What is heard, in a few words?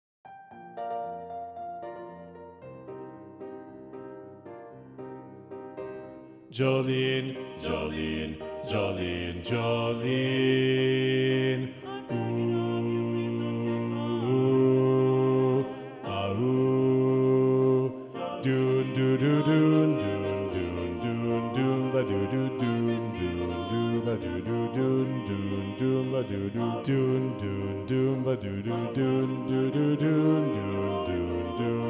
Bass